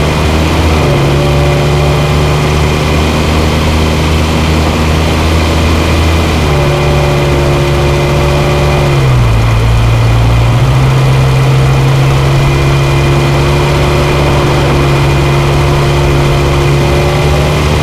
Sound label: vehicle pickup move loop